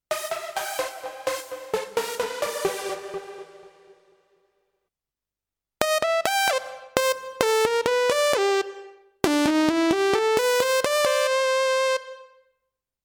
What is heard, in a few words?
Korg Pa1000 Synthesizer